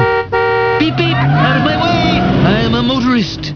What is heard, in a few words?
beep